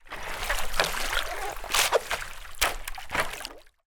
sport surfboard in water movement body rub squeak water splash